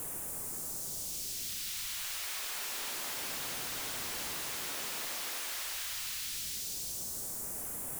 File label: spray; grunk